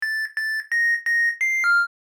Dishwasher cycle end jingle; appliances

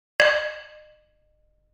Brake drum single; gfxsounds

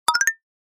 Bright positive click

